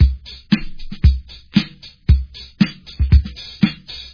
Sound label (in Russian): barabannij lup hip hop priglushennij